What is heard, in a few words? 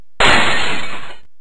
smash